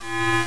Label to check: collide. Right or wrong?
Right